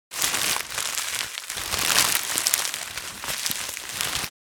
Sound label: Rustling small paper bag